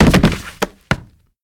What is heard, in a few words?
wood fall; Golden Crayon Sounds